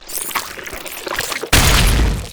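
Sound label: spider attack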